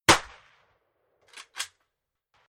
Firing Range Gun Ak47 Single Shot Reload Slapback Echo Urban Enviroment; Weapons; Rifle